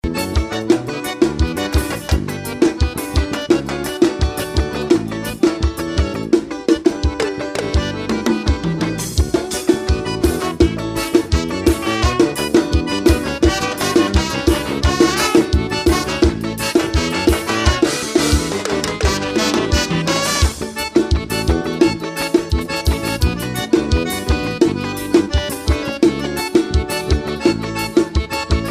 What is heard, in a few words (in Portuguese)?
passagem de som, forró